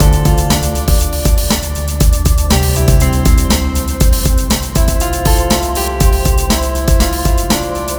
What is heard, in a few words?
PIANO